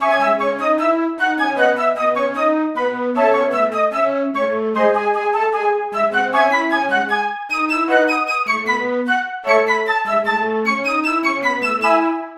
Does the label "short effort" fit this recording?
No